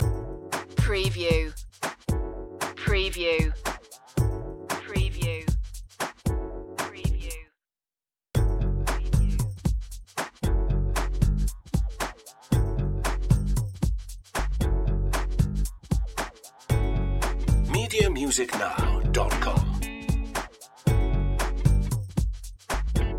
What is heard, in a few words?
sexy beat inst